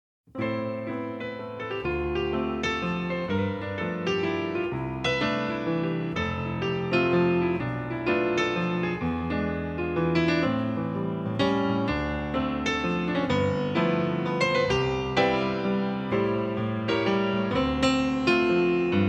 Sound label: piano; Neon Genesis Evangelion; Evangelion S2 Works